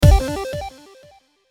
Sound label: Hit Cymbal Bass Digital Beeps Delay; Blastwave